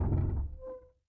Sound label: door large wood movement start; Rust